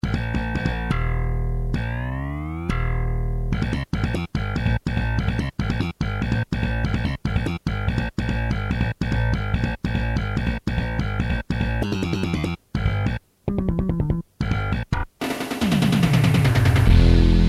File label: funking brill